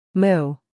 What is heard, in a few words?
moo